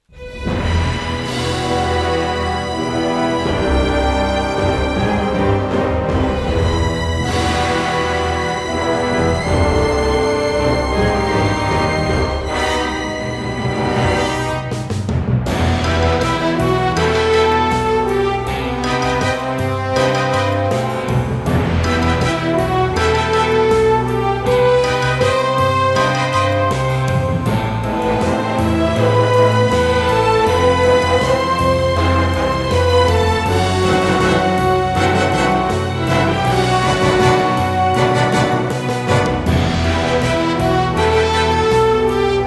Sting 80BPM 4-4; Maximillian; Evil Genius 2 World Domination; PS4; Xbox Series X/S

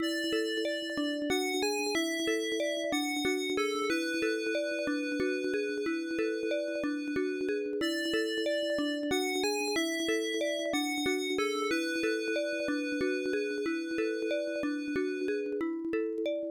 Cave; Digan no Maseki; PC-9801

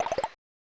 Unused Jingle; bs goods press 7; snes

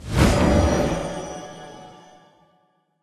Soul impact Green beam

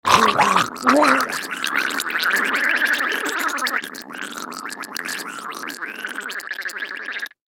Choke Blood; a dump in the dark

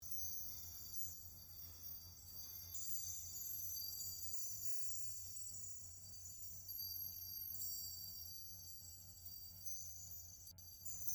Floating Symbol Ambience Quad Surrounds; Journey

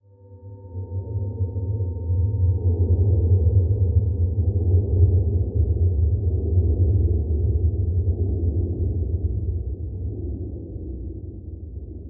space whale vocalize flutter

